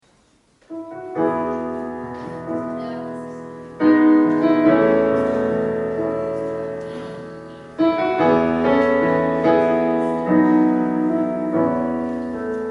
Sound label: live Solo